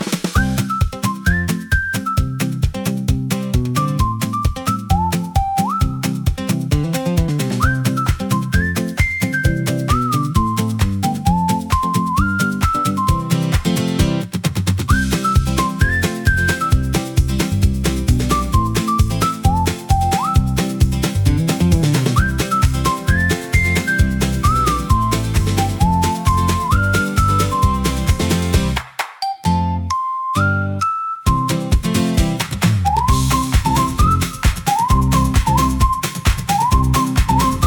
Sunny Start Sprint, hand wraps whistles for cheerful active